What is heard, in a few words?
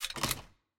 Turret Take